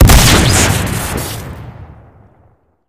back scatter crit, weapons; tf

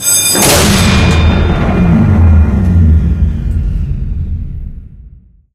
elevator emergency power off; source engine; left 4 dead 2

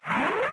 travel restore up; windows 9x; sound schemes

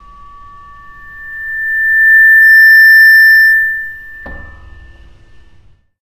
feedback; Left 4 Dead 2; Source Engine

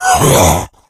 death, infected

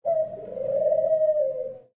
dove; Source Engine; tf; rottenburg

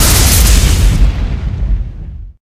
spell lightning ball impact; halloween; video games; source engine; tf

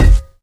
physics cube

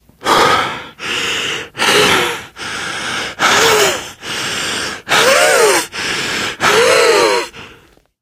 exertion major, biker; source engine; left 4 dead 2

